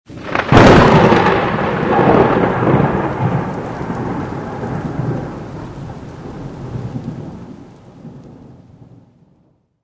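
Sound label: sound of lightning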